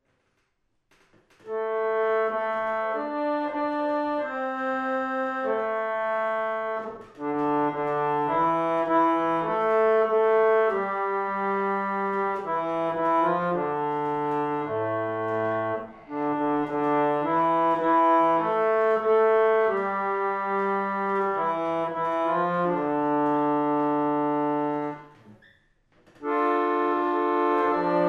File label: Debain Harmonium